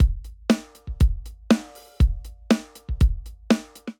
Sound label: Piccolo Kit, Drum Kits; Ableton; Drum Booth